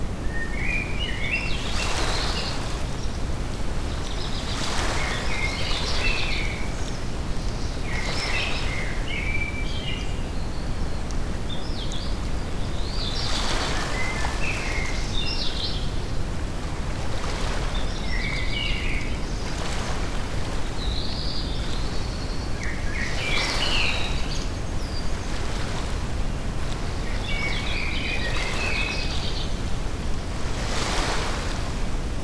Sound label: Relaxing Sounds of Nature White Noise; for Mindfulness Meditation Relaxation Music